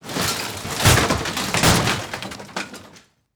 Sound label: wood glass debris